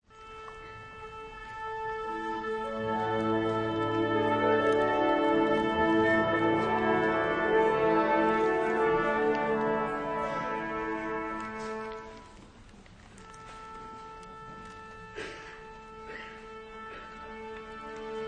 Concert, Orchestra tuning up during concert, with some coughs and audience noise in the mix; Crowds; Crowd Sound Effects